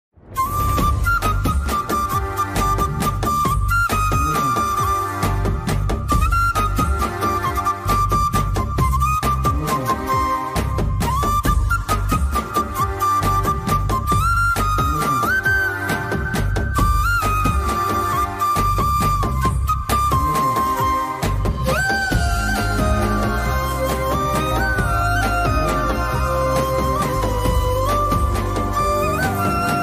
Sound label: flute bgm flute ringtone; raayan